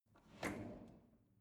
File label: drawer cutlery open draw short